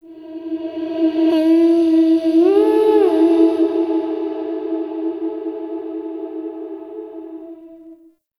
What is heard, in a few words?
Withering Tree Vocal; Glades Of Andurin